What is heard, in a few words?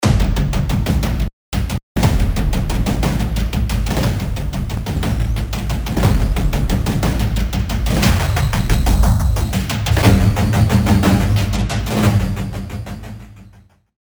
Loop Tight Step Mod Chorus Phaser; Cerberus